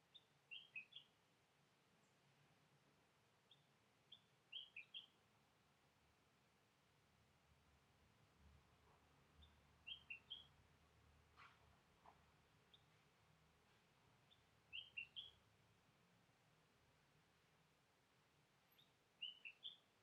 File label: pippiropi, Birds